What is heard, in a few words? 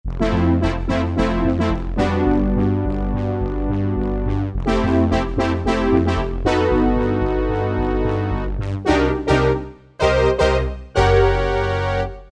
BR Fatti, Yamaha TG500